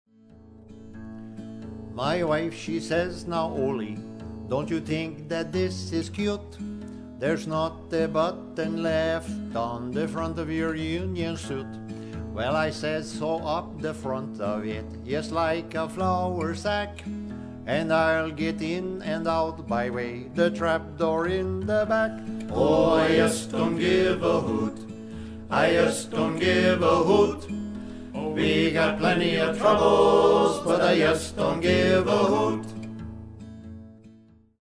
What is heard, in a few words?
hoot